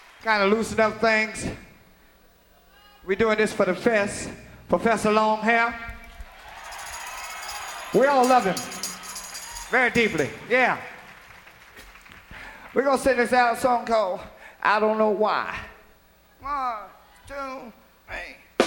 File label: funk